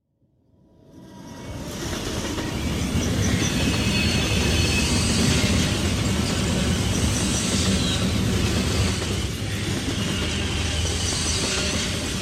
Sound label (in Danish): Tog forbi; KØRETØJER